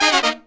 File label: Phantom Horns, 132BPM; Zero-G